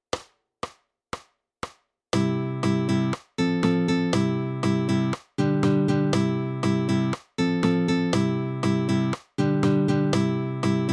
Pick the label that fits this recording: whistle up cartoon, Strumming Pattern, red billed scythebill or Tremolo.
Strumming Pattern